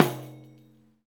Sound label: Pandiero, Tambourines